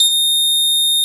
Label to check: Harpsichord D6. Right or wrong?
Right